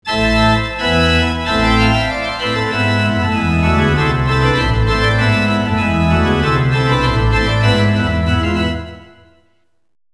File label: organ